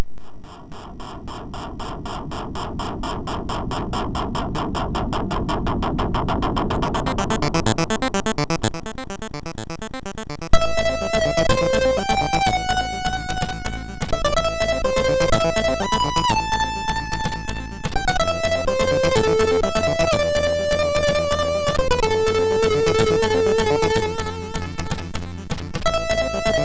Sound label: sid, MOS6581R2; Chopper Command